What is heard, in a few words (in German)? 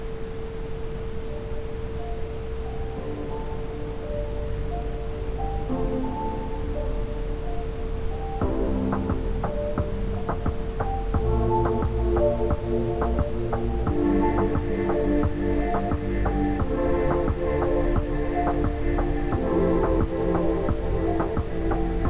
Ajna Klarheit mit Ambient Sound; chakren